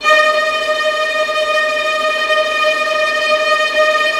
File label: VIOLINT, Strings; ILIO